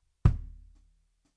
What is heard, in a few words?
BD Out